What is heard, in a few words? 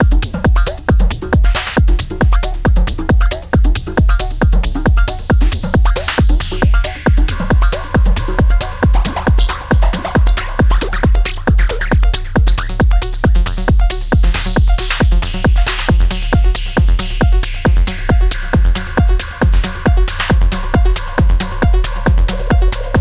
Multitimbral TECHNO PARTS, synthesizers; Redshift 6